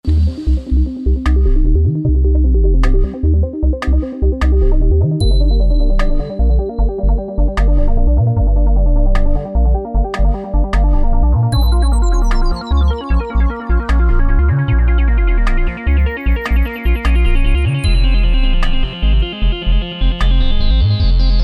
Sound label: Tribal Vibes